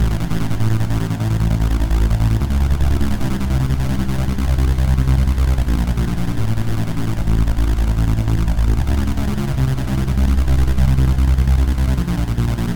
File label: Saw Loop